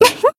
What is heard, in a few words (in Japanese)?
Voice attack jp; hoozuki